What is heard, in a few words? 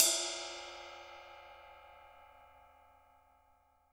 RIDE, Drums Acoustic; USB Soundscan vol.10; AKAI; 90s sample CD